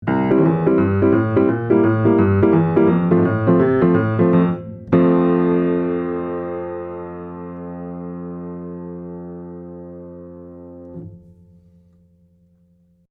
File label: Piano Advanced Limit minus2dbfs